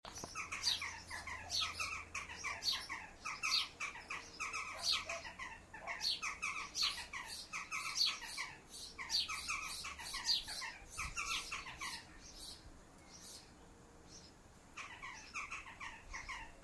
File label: jackdaws